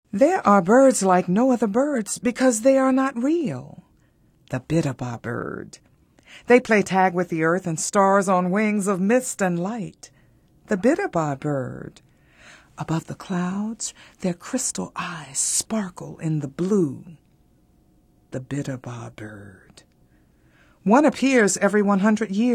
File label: dramatic